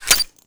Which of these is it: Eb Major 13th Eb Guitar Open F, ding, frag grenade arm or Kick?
frag grenade arm